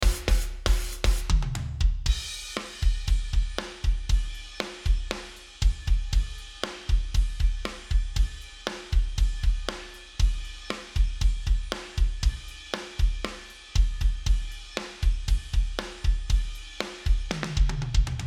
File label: Drum mix